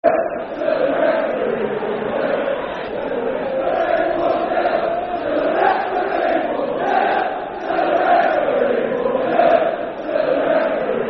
One Nil To The Referee Football Chant (Soccer Song); Bradford City Bcfc